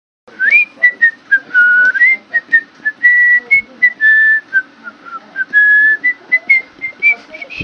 Cheery Whistling

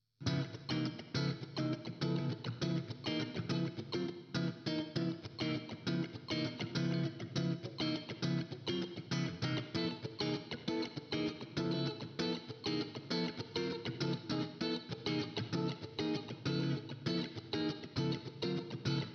C Funk Guitar

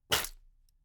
Grab Keys

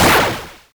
Shoot

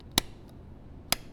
Open Close Interruptor

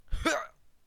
Hurt Male

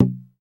Souvenier Cherokee Drum

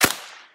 Ppsh Shooting

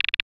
Character Reveal

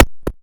Button

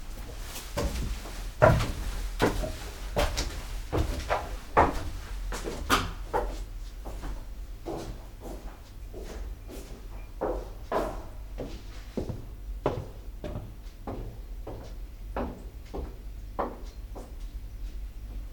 Basement Walk Stairs

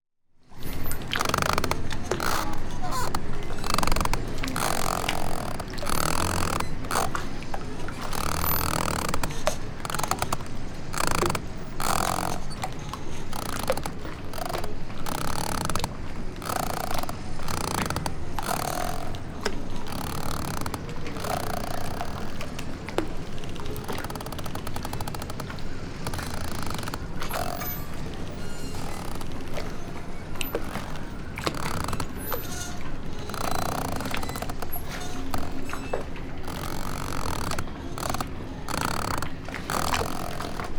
Tie The Boat

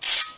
Sword Drawn

